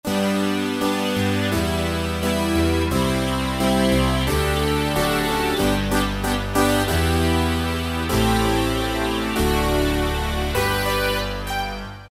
Polyphonic Synth; Roland PN-D50-04

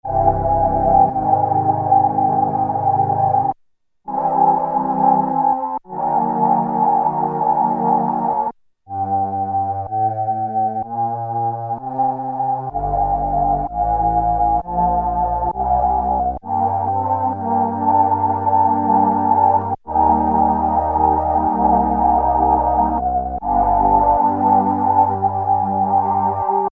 Chorus (Male); Factory Presets Bank B

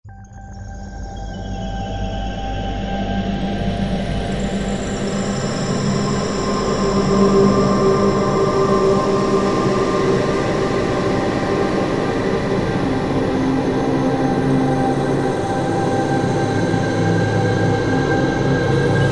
One Finger 4 SFX

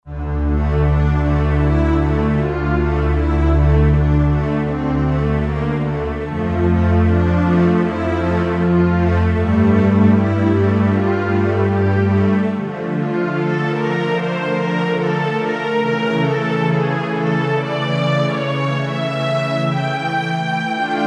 Somber Str, Roland JV-2080

